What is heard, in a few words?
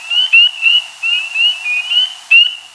spring peeper; frog calls